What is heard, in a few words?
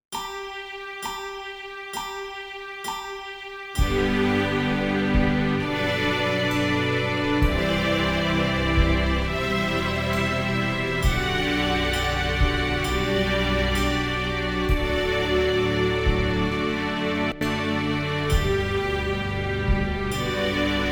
Exercise Horns in Fifths